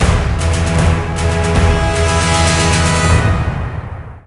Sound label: Sting Combat Start